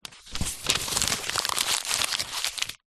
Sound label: paper sheet a4 scrunch up; zapsplat; foley